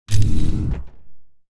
Object Dungeon Gate Open; starbites

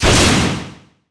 Ice Arrow Flying; Knight Online

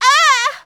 Enemy Generic Human Death Crushed

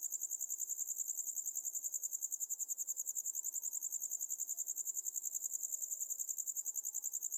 Amb Env Evening Crickets

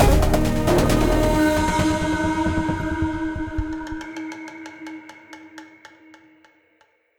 Win Loose

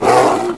Wolf Dead; Knight Online

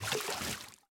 Water Up

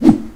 Dash Sound; unsighted; enemies

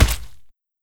Hit Weak

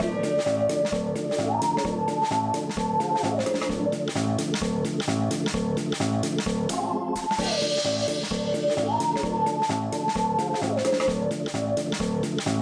Ghost House

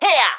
Pecheneg Archer Attack

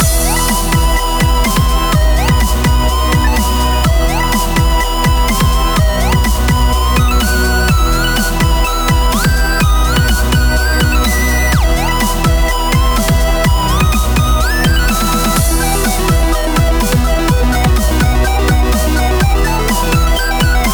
Music Ascension; Soda Dungeon Lite; Android, iOS, Mobile, Online, Windows

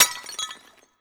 Eye Explo; Sarco Horus

